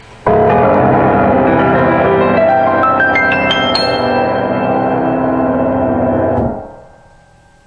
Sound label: piano